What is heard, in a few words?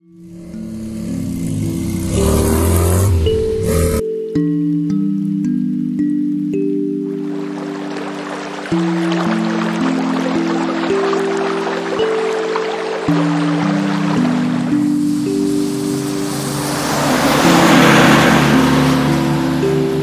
kalimba